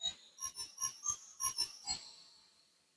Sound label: Glockenspiel